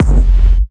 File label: Kick